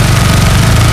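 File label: polycraft machine gun loop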